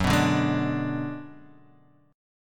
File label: D 6th Flat 5th F sharp